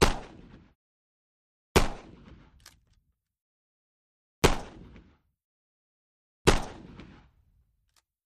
12 guage Shotgun Sing; mvmt

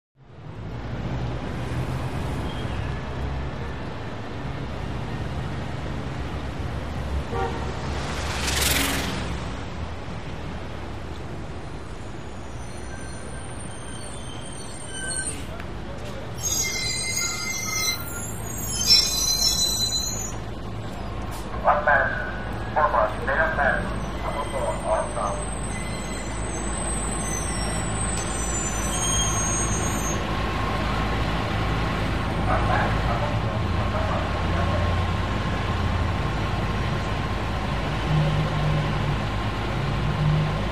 Bus Stop Bus In Stop Cl

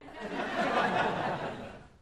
Crowd 100 Short Medium, medium int

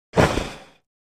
Beast Snorts Monster

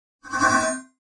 Pop Up Elect Whip Phas, pop up element

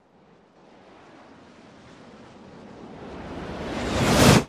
Fightership By From, spaceship fx

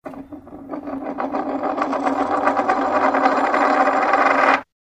Coin Spin Heavy

